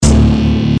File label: backing track chunk; Adventure Time - Elemental; ThrillGames